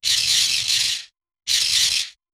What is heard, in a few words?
teleporting sfx; sci-fi